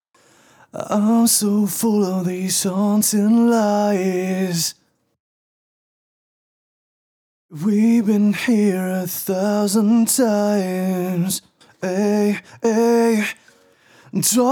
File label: vocals